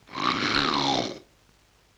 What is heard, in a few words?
LoFi Synthetic Lion Roar; Vintage Cartoon; Comedy Vocals